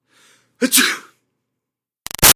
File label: Human Male Sneeze; Sneezing; Sound Effects:Human; Big Room Sound